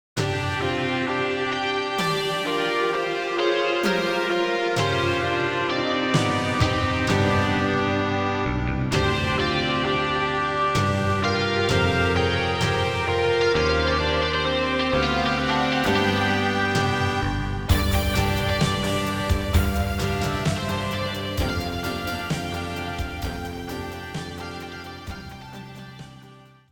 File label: rock